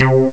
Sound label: Growl, Amiga Soundtracker; ST-XX Amiga Soundtracker Sample Packs